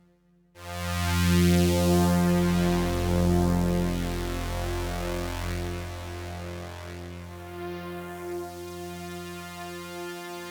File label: K2000 Solina Phaze